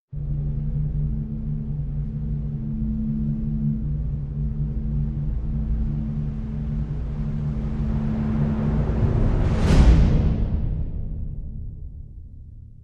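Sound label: Orchestral Crescendo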